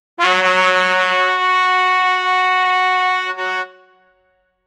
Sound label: a single triumphant heroic